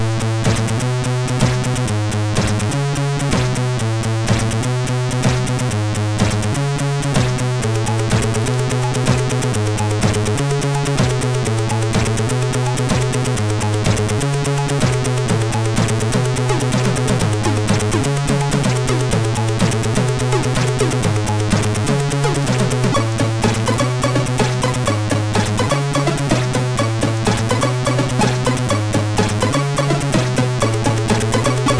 Music, MOS6581R3; Music by TSM

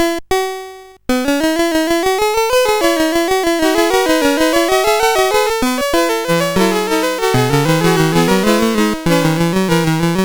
Fugue No 1, CSG8580R5; Fugue No 9

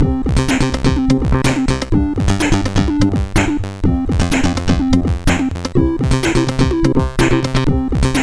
Interlude sid CSG8580R5; hvsc

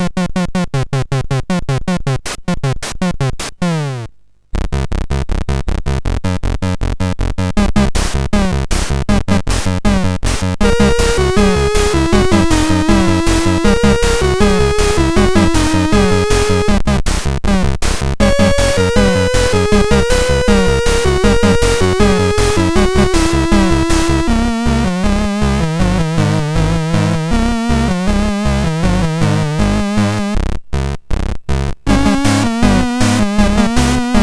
Balloon Raid, MOS6581R4; sid

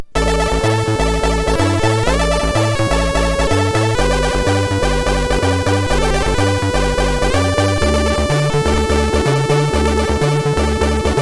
sid, MOS6581R4

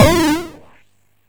SFX, MOS6581; Narco Police

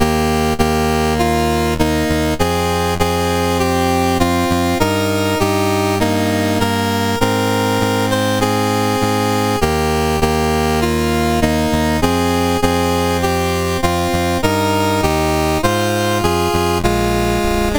menus, sid CSG8580R5; Games Summer Edition

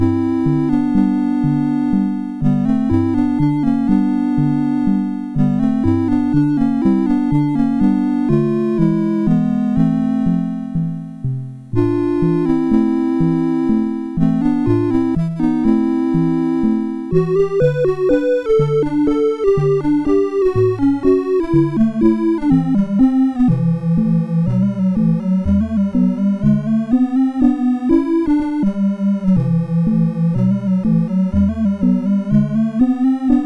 Polonaise Opus53, MOS6581

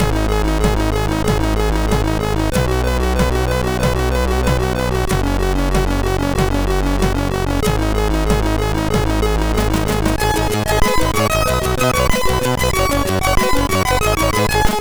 Computer Game sid MOS6581R3

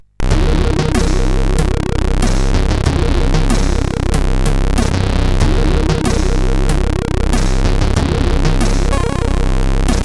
Slow Funk, sid CSG8580R5

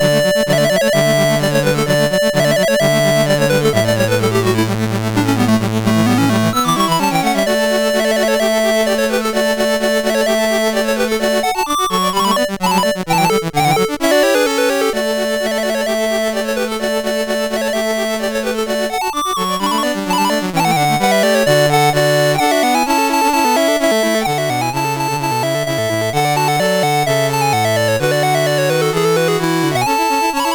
Tambourin, CSG8580R5; Dave Marquis

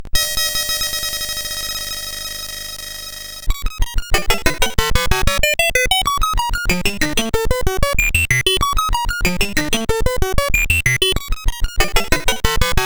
sid CSG8580R5; Spin

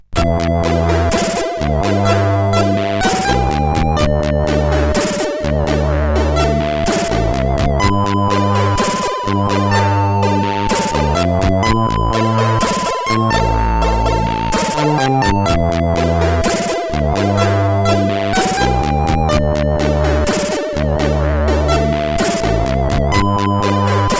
sid CSG8580; Magic Caves

